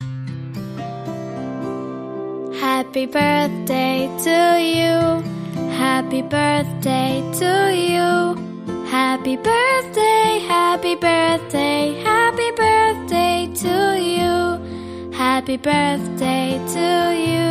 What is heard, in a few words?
Girl Vocal with Acoustic Guitar Happy Birthday